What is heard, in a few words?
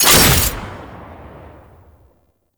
Shot; Fulmin Prime; Weapons